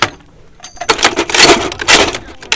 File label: Vehicle reloads; Red Orchestra: Ostfront 41-45